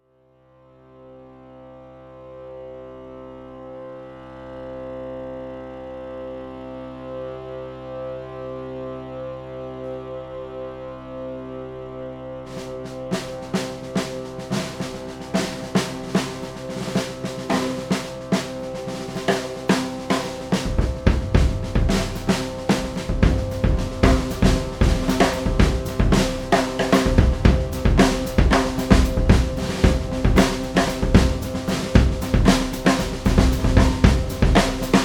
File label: Drum & Drone; Whiplash; Soundtrack